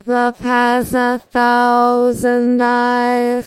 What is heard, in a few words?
singing